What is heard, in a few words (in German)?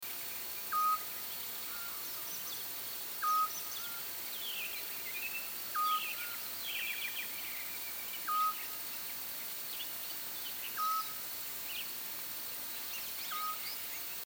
zwergohreulen